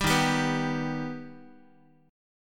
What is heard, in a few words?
Eb Augmented Eb, Guitar; strummed; JGuitar